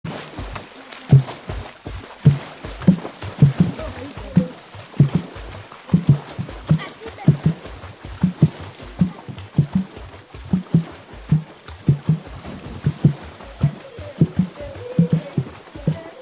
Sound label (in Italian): tamburo acqua